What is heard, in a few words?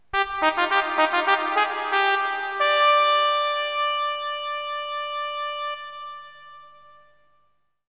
Signature Sting; Clue; Col. Mustard